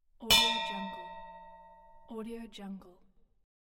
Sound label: bell